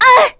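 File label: Damage, Korean; Yanne; kings raid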